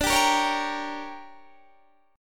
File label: D Major 7th Suspended 4th Sharp 5th Guitar Standard, chord; DM7sus4#5; strummed; JGuitar